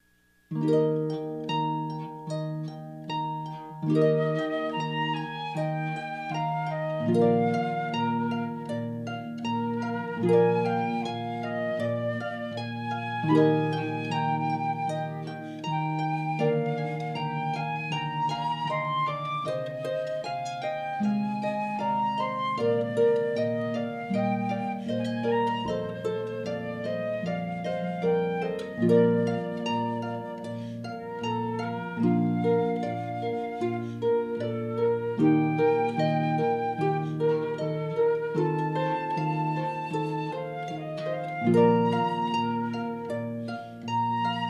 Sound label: Harp & Flute; Vivaldi; Largo